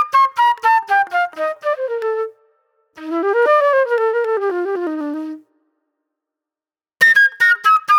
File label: Fast Funk Flute